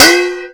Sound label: BOWL KLANG; Rarefaction; Poke in The Ear With A Sharp Stick